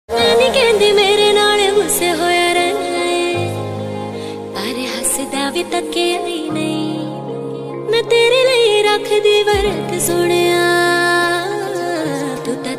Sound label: sohnea female ringtone